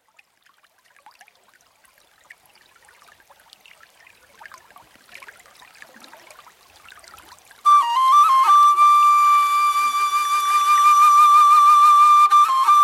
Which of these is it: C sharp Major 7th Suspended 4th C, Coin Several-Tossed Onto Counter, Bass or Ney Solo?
Ney Solo